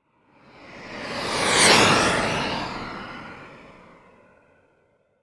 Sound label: flyby